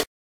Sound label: spin; quatuor